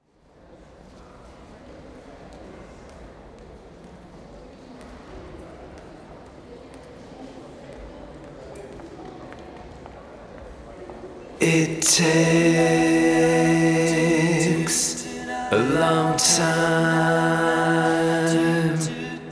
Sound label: folk